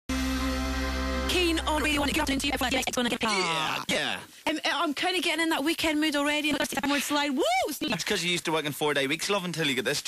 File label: choppy audio; prolink; p7000; pvr